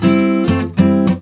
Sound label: Guitar Riff; the princess and the frog; Swamp; Music Ray